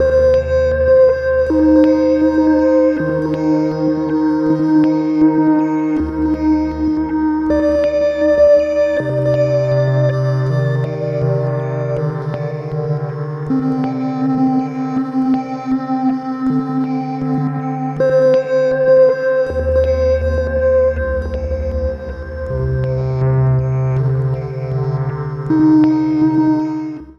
texture wobbly C D Eb, One Shot Textures; Transmission-X

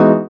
SYN RELAX, dance synth; Sound & Vision - Gigapack I CD 2 (Roland)

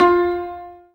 ZIMBAL F 3

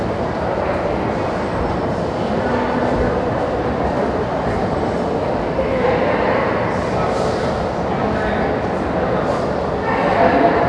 lobby, crowd; AKAI S6000 CD-ROM - Volume 6; Back Ground

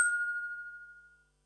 HH, Pearl SC-40 Hihats and cymbals

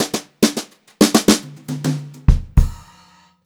FILL, Brushed Drum Loops

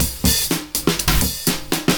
CYMB, Breakbeats; USB Soundscan vol.46; AKAI

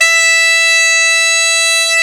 CHANTER E4; World Combo

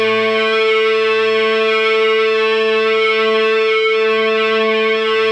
Cigar Feedback 90 A, guitar drone

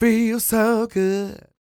CROON, Vocal Blues & Soul MELLOW

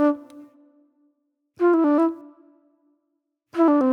Movie Flute

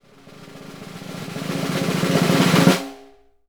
SNR, Synclavier Percussion 2 - World & Orchestral

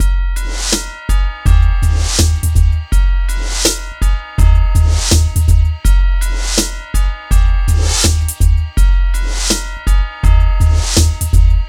MIX, Hip Hop and R&B Drumloops

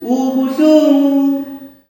"UBOO-SHUUN", MALE; Spectrasonics; Heart of Africa 2; S.MALEAMB

